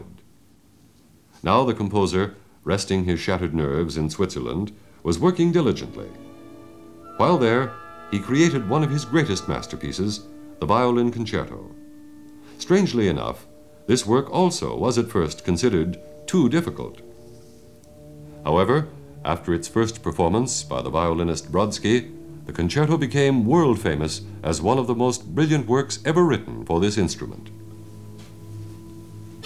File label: Violin Concerto in D, Op 35 Finale; Tchaikovsky